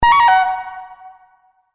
Multimedia Event Notification, beep sound effect

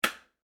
Household Washing Machine Button Push; Cleaning (Sound Effects:Household)

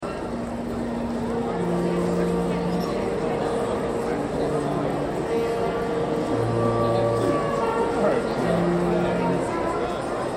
Crowd High School Grad Idle Background Band; Auditorium; Chatter; Crowds; Backgrounds; BigRoomSound

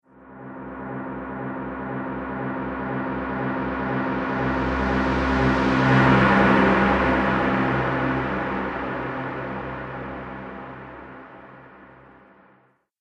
Trailer Sweep And Hit; Miscellaneous Impact Sound Effects

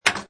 Pinball Sound Flipper Or Bumper With Ball; Video Game Sound Effects

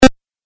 8 Bit Style Blip; Video Game Sound Effects

